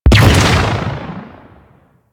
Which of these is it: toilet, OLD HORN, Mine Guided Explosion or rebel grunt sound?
Mine Guided Explosion